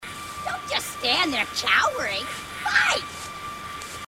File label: grunt; h3dialogue; grunts